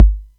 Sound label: Atlantex Music Percussion Computer Kick, drum machine